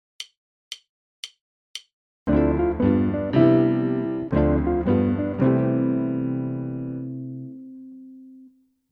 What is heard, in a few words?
GUIT